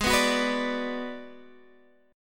G sharp Minor 7th Flat 5th G sharp down Guitar Standard; G#m7b5 strummed; JGuitar